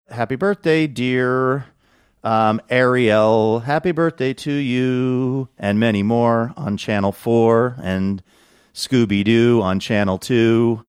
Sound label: singing happy birthday